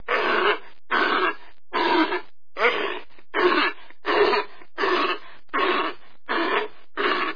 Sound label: Odobenus rosmarus, Mammalia; Chordata